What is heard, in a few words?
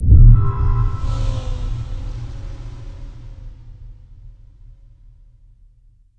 VFX Atmos